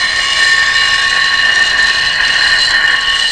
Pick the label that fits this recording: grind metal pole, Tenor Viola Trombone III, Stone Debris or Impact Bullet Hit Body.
grind metal pole